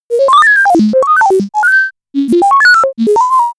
alien computer

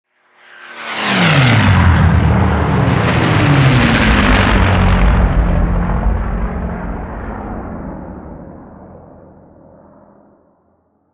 flyby

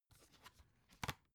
dvd case close

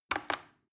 plastic button little click regular fast

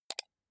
computer mouse right click soft fast

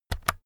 keyboard external key press fast

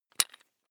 electric drill bit loosen

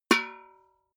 metal bucket hit ring small

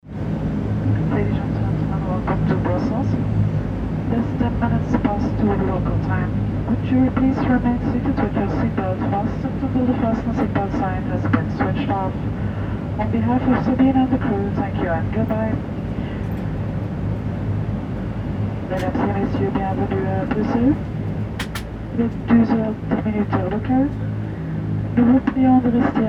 turboprop cabin

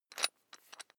electric drill bit fasten wrench turn loose

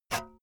saw use short

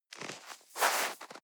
step snow scuff soft

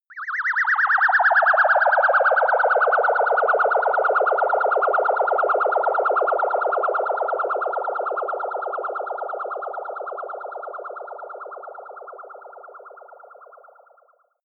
flying away ufo

